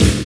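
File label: BURN SNARE; Drums In Your FACE!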